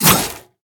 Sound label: hit; smite; daji